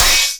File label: hithat crash cymbal